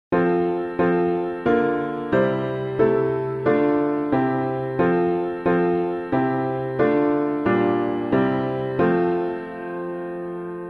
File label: From Ashes to the Living Font, piano; Hommerding; SAINT FLAVIAN